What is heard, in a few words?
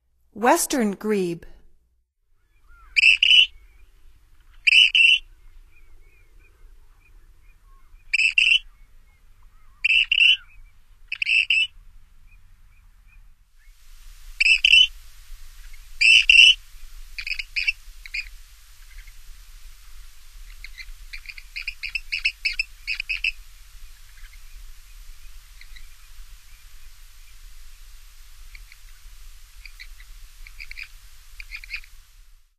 Western Grebe, Songs of Birds of North America; Stokes